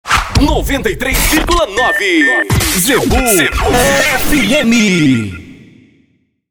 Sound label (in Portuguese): Vinheta; Zebu